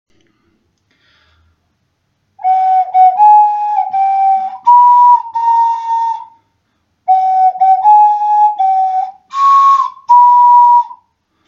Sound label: happy birthday song, ocarina